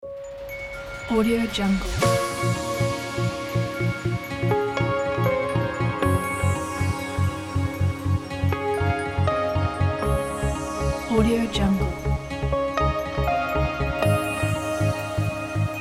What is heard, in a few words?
ambient dance